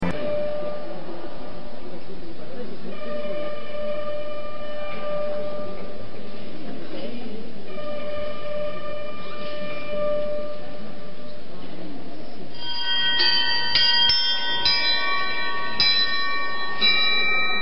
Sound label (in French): coq; Lyon